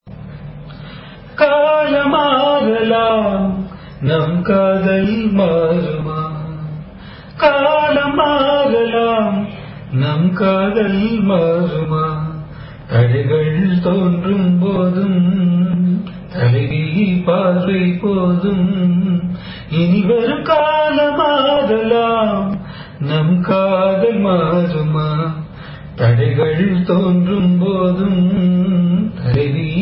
raga hamsadwani cine, carnatic music

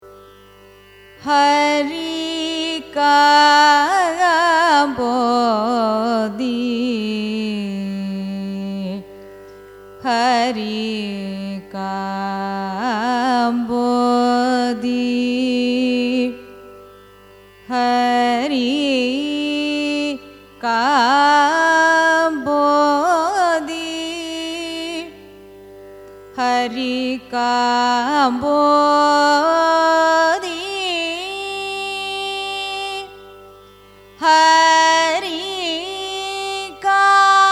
raga hari kambhoji signature; 28th mela; carnatic music; harikambhoji, hari-kambhodi, hari-kambodhi, harikambhodi, harikambodhi

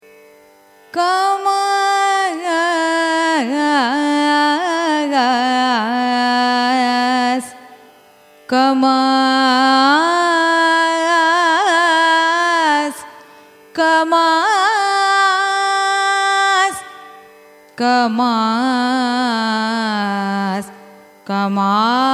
raga khamas signature, carnatic music

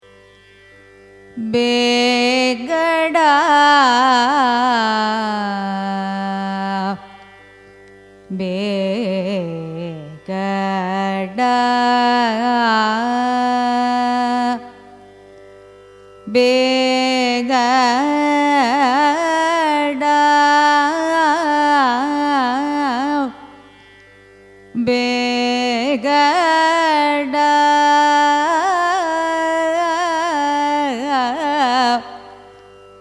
raga begada signature, carnatic music

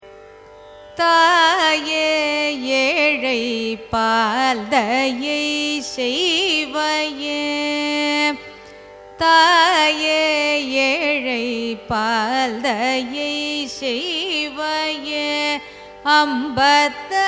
raga bhairavi carnatic

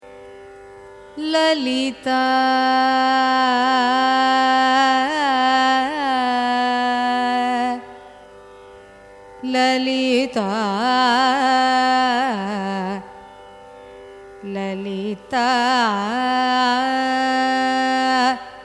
raga lalitha signature; carnatic music

